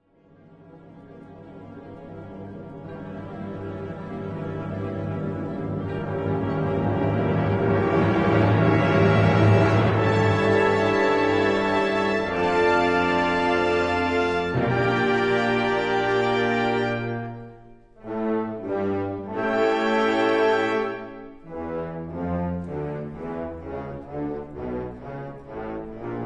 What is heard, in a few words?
Toccata Fugue